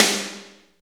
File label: SNR, Tekno Industrial; East West